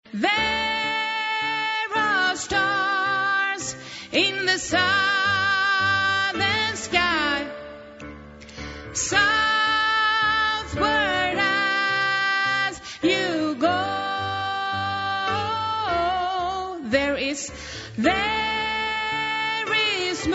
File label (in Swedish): sopran